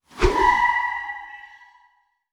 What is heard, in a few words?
Transition Whoosh; Audiosurf 2